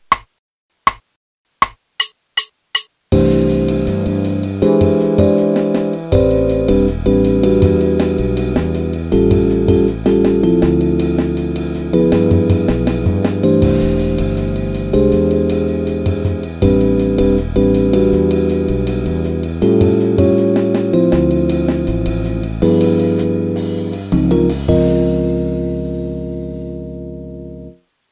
C Major Scale, 2 octaves qn=160; Play-a-long; Jazz Piano

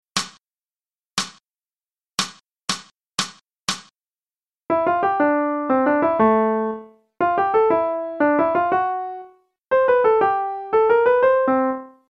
Transcribing Rhythms; non-swinging

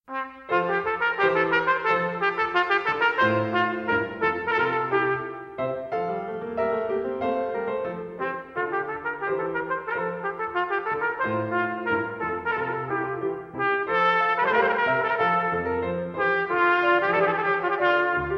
Trumpet Air (qn=92); Telemann

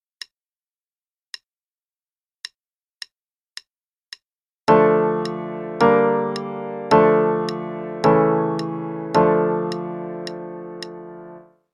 d minor chord progression (qn=108); CM Level 2

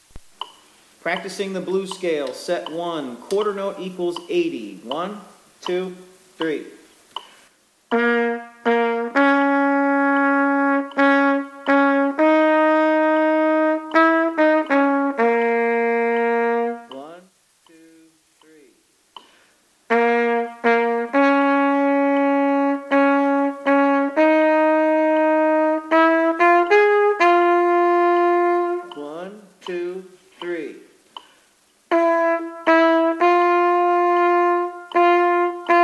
Blues Scale (qn=80); trumpet with click; Chwalik; Playing the C Blues Scale, Set 1; lessons Trumpet

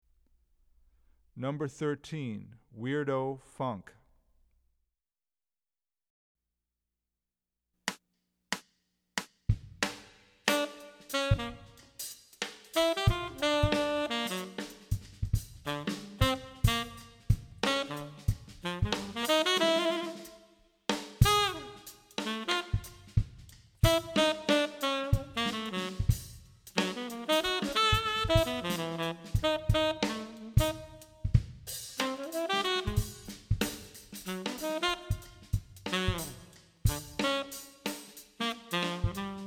Weirdo Funk, Trumpet; 14 Blues and Funk Etudes; CM Level 8 Solos (jazz)

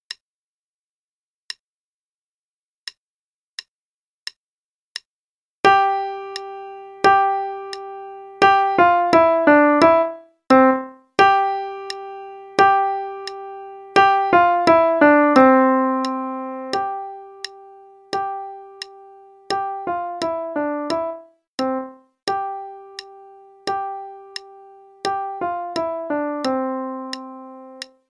Old German Dance, RH only 2nd half, qn=88, piano; Play-a-long; Praetorius

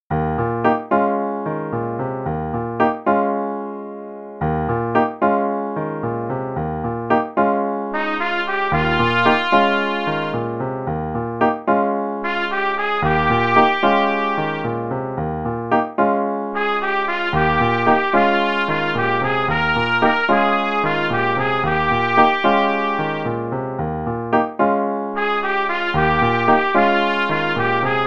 no click, hn=112, trumpet; The Really Easy Trumpet Book

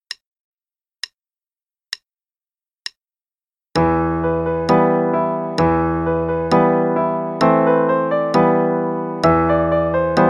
Play-along Ey Iran, Persian Music

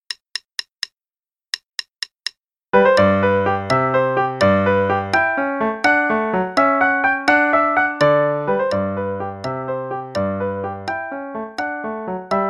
Play-along Gigue, dq=84; Telemann

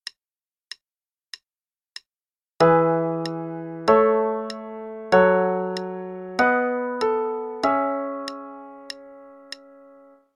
Chord Progression - E Major (qn=96); CM Level 6

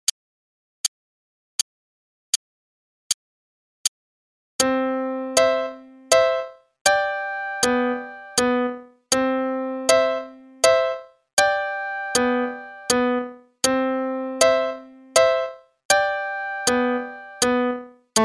Kitten Play (qn=80); Play-along; Gurlitt

